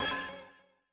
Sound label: PAD, Yamaha DTXpress-II FX; WAKX PAD